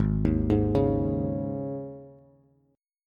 G sharp Suspended 4th G sharp, Bass; G#sus4 arpeggiated; 4,6,6,6; JGuitar

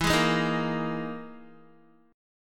E Major 7th Flat 5th down Guitar; EM7b5 strummed; JGuitar

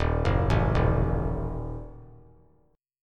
Eb Diminished; Ebdim arpeggiated; 3,1,0,1; JGuitar

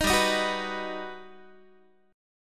G Augmented Major 7th, Guitar; strummed; 18,15,18,16,15,x; 4A,4E,5A,5D,5Gsharp,6Csharp

